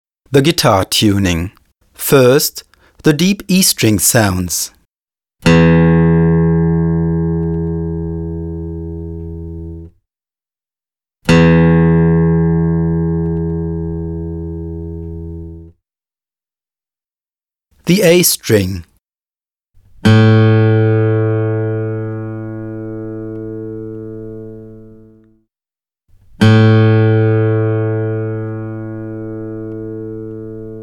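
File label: Tuning, ukulele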